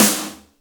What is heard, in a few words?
TRASH SN; Big Fish Audio; Synth City